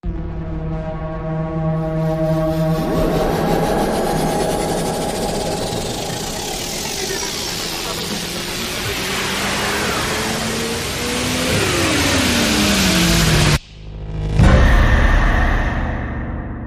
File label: Rise Complex Dangerous